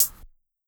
soft hit normal; osu

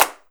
clap; osu

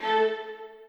strings

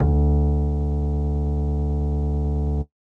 bass long D3

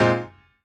piano lo

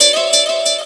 guitar

